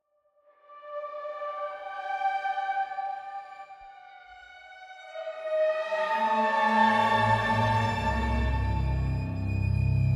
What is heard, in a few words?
Melody